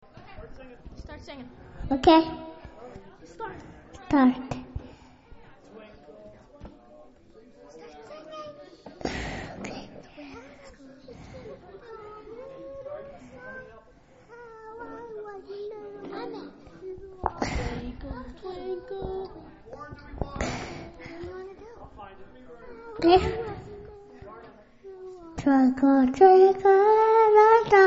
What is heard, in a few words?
twinkle, kids singing